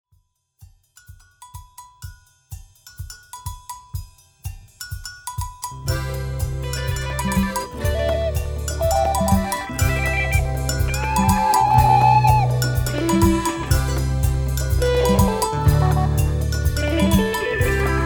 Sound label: Samba Gitana (band)